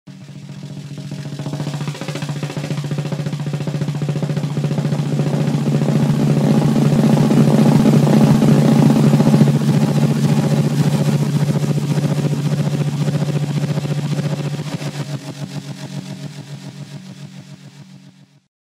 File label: Snare Drum; اسنیر درام